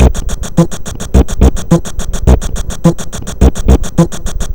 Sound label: cough